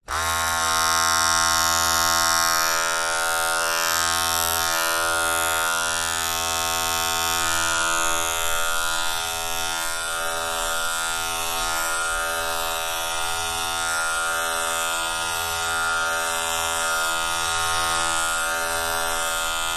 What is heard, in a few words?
household hair clippers electric on run move around off; zapsplat